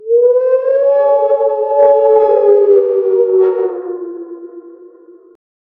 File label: siren in the 50 meters